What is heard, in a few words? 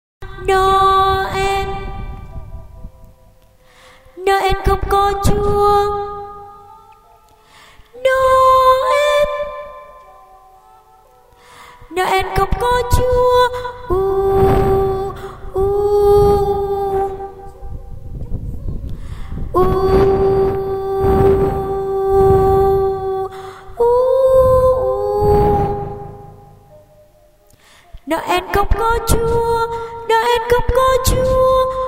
tenor